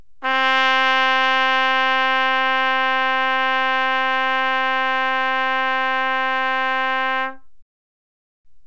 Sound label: C Trumpet C4